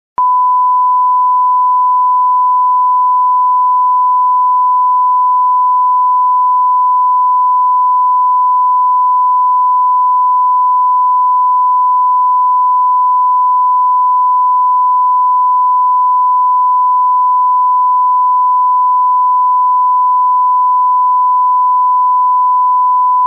1khz; Samsung Z3

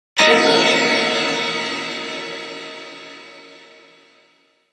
China-stones alert, Nokia; 8800

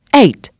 key

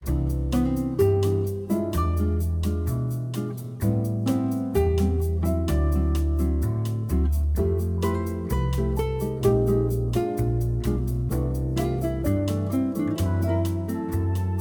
The Voyage acoustic; Samsung Galaxy S22; ringtones; Calm

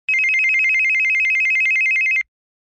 Single Tone, Samsung phone tones; SGH-T669 Gravity T

